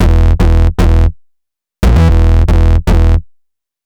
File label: HipHop Synthbass Emaj, Hip Hop Bass Synthbass loop; Digidesign; Pro Tools Essential Loops